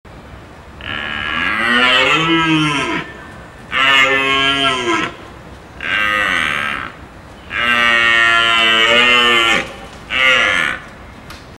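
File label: olen golos, deer voice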